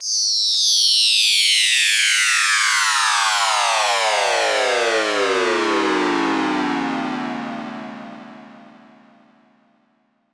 FX Downlifter

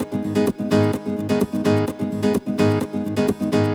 Nylon Guitar G# min, 128BPM; Vengeance Samples; VEH3 Nylon Guitar Kit 2

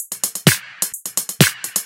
House loops 128BPM; Vengeance Samples

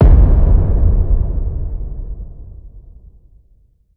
Hits & Explode

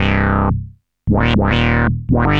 ARP Odyssey, Arp A Line, synth samples

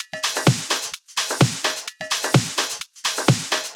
House Loop, 128 BPM; Vengeance Samples

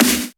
Snare; Vengeance Samples

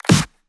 Pre-Shifted Claps; Vengeance Samples

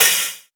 Cymbals OH, Open HH; Vengeance Samples

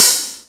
OP Hihat; Open Hihats

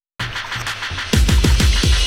Fill 128 BPM; Vengeance Samples; VEH1 Loops

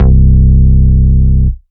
Ultimate Bass Root C; Vengeance Samples

